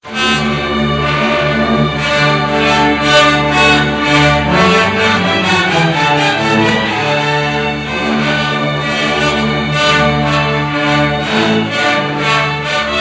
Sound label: tromba concert